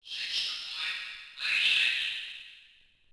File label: subway